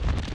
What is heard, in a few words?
Snow Step Left; Movement